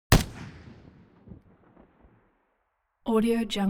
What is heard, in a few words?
weapons rifle ak47 exterior fire single distant